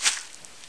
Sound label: grass; XDM